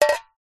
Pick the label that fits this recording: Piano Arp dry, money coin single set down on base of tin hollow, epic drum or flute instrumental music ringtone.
money coin single set down on base of tin hollow